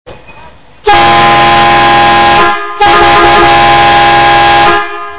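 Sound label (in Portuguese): buzina duplo som de alumínio p caminhão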